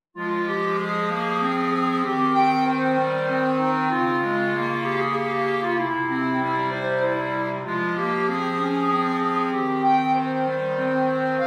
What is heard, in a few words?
Chaconne; Pachelbel; pachelbel-chaconne-arr-bernd-jakob